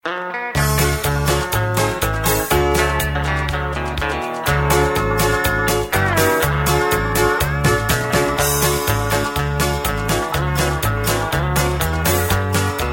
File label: music